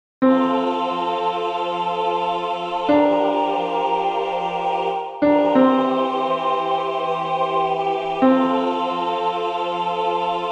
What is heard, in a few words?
Ave Maria, tenor